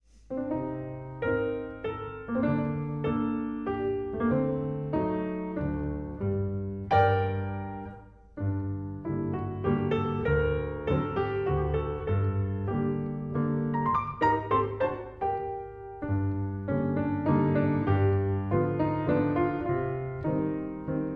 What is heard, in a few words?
Plegaria piano